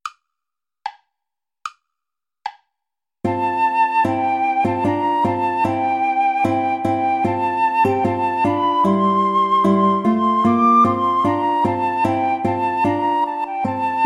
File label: play along without guitar